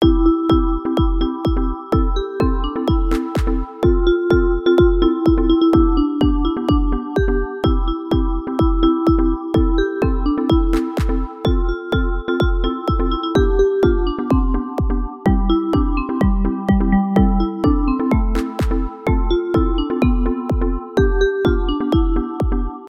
Jingle Bell's Tone Ringtone

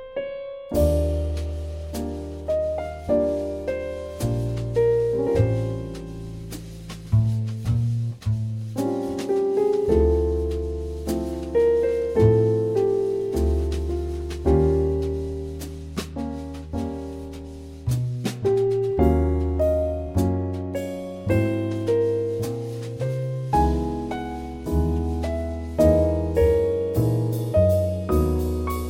iPhone alarm ringtone

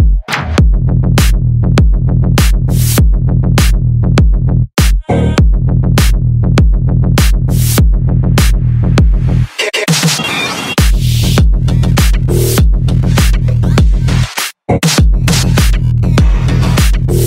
Samsung Ringtone; Galaxy M12 (India)

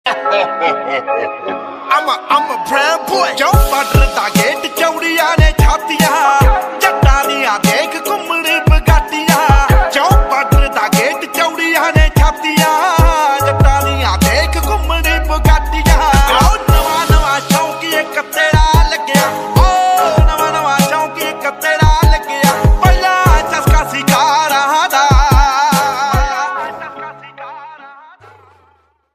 Punjabi Ringtone

